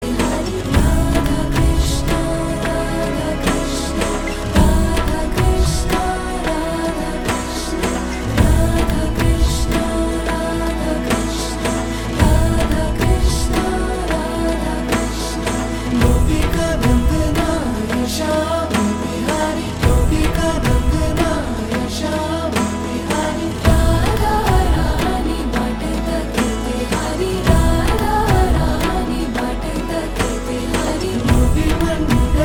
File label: Radhakrishna Naamawali Ringtone